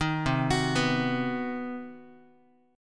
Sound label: C Minor Major 7th Flat 5th chord, Guitar; arpeggiated; JGuitar